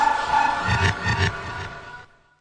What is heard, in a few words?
Electro FX; UNUSUAL KITS and FX; Ueb HE Electro FX